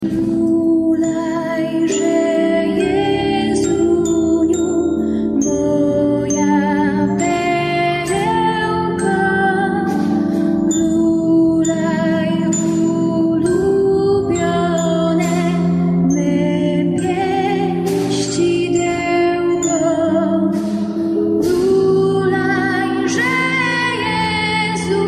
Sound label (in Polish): dzwonek